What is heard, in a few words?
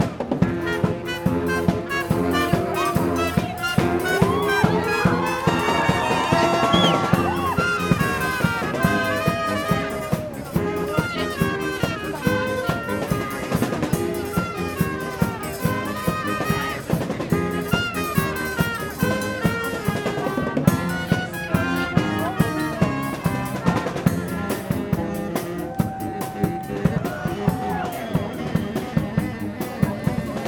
Kazan Street Musicians (Brass Band and Guitar Player)